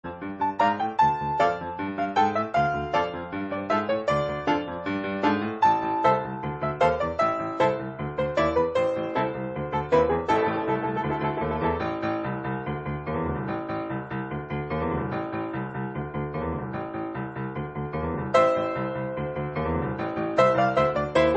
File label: grand piano; Ghosts 'n Goblins